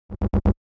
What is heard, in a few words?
125 BPM A# Min Bass Fill; Melody Loops; Loop Stems; Cymatics; Antidote